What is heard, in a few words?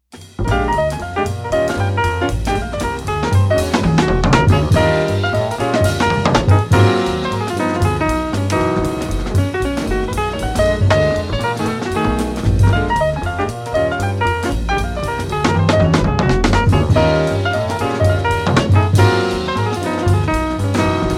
blues